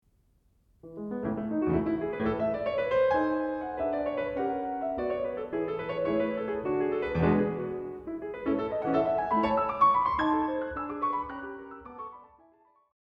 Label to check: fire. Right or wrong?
Wrong